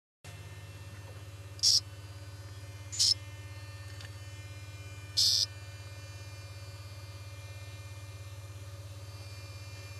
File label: D variabilis, chants